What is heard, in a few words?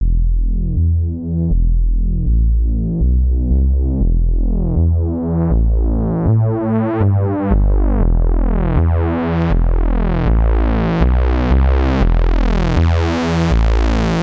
ensoniq sq-80 sounds, bass lead